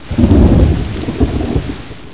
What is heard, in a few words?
thunder rain